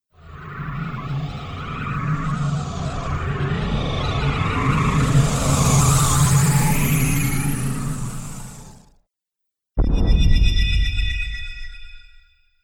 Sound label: Magic Spells; Magic Sound Effects